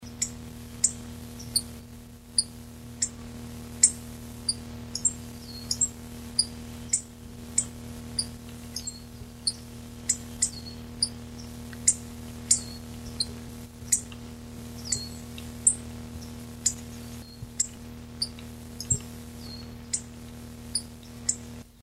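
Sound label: Coccothraustes coccothraustes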